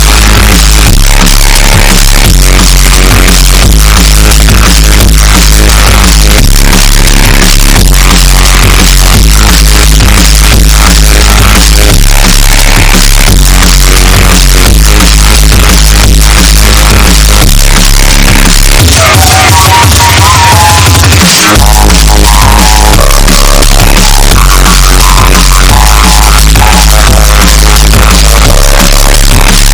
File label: hardcore flute